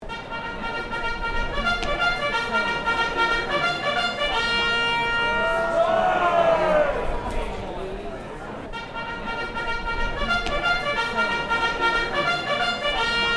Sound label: Charge Ringtone